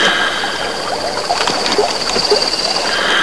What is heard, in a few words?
cave flow; quake2